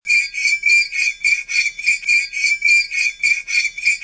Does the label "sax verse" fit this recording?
No